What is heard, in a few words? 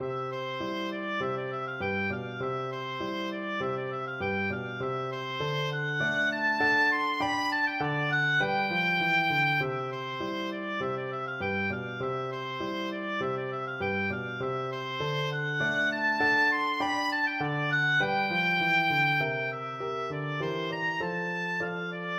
jemmys fancy, oboe